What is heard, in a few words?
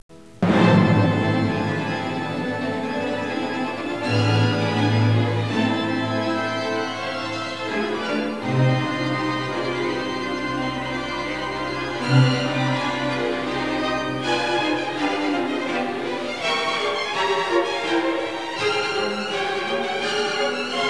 Organ Concert